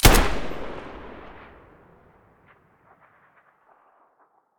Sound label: muzzle galil stereo, weapons